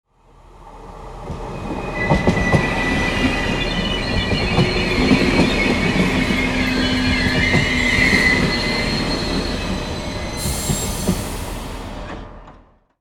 Train brake screech and slow down; vehicle

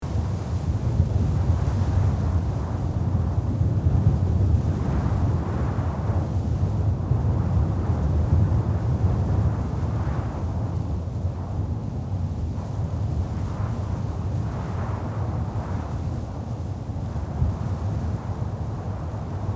Desert sandstorm ambience

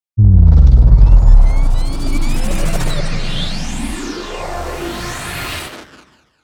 Time warp slow motion portal; energy

